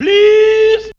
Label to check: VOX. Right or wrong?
Right